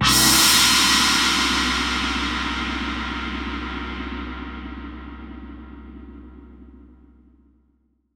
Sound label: Crash, impact samples